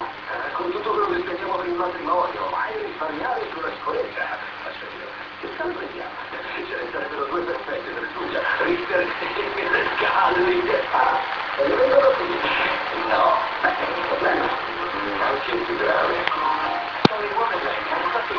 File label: spectra laser test